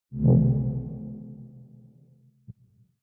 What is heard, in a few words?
synth; smite; chronos